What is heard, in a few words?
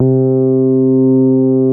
BASS STOP